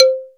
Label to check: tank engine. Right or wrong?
Wrong